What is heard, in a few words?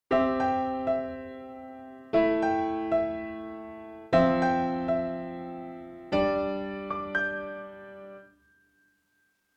Korg SDD 3000 Piano Detuned Synth; UAD